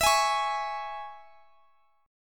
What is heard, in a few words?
E 6th E down Guitar Standard; strummed; chord